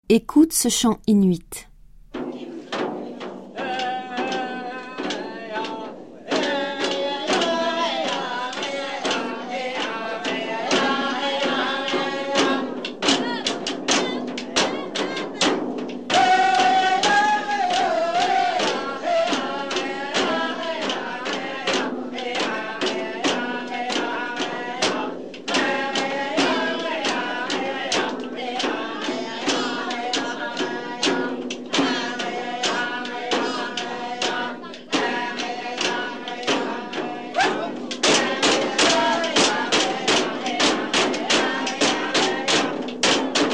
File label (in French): Chant inuit